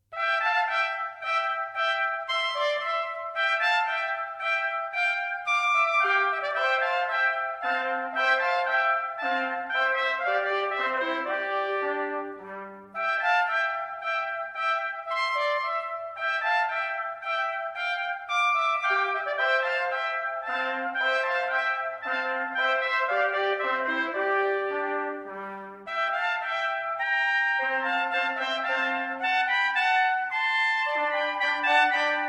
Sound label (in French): Menuet; Molter